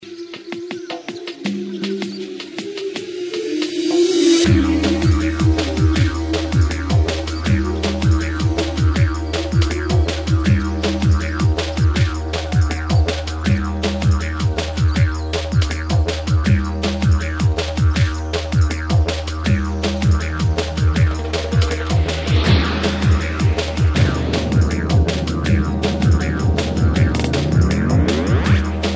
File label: jungle